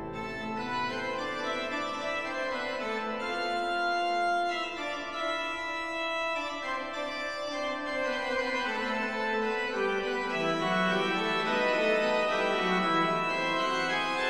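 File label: Fugue, organ; J. S. Bach; Piet Kee Plays Bach, Volume 3; Eight (short) Preludes and Fuges; No. 7 in A minor, BWV 559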